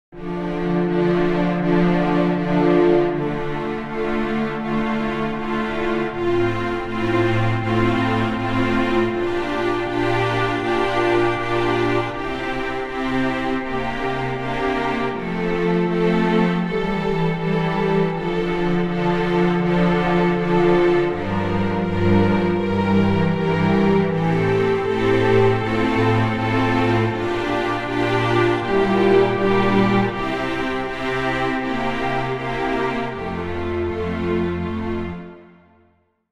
repeated eights

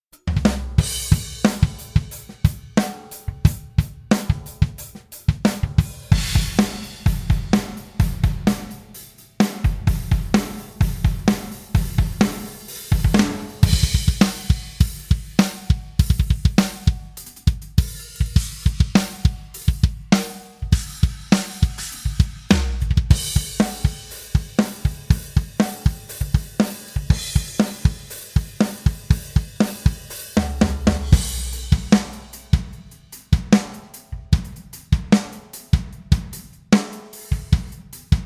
Bass Drum Variations, hi-end acoustic drums; DRUMASONIC

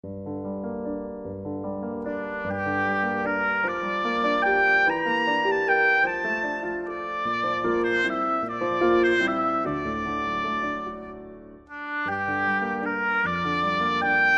oboe; Sicilienne; SWAM

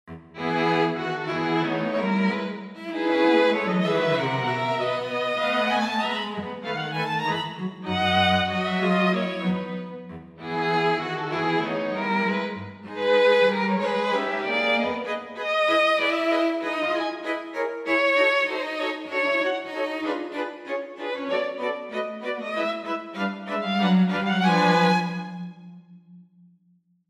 quartet, solo strings; vsl se

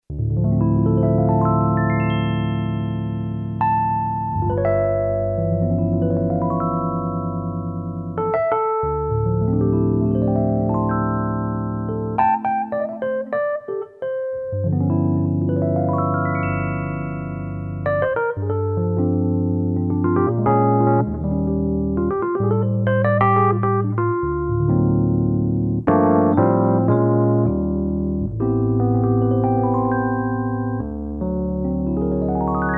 Mark I and amp, Rhodes; Rhodes module

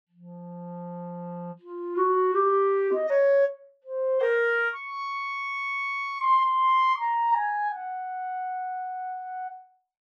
Clarinet dry; VSL; SPAT

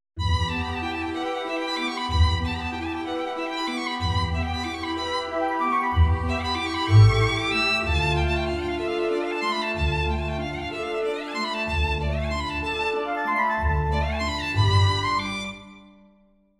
Violin; SWAM violin in orchestral context

